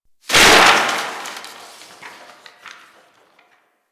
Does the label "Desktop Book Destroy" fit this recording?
Yes